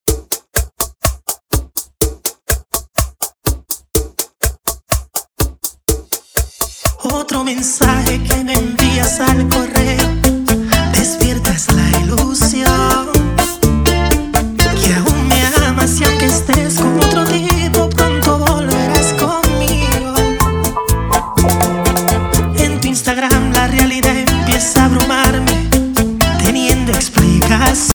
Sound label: remix bpm 124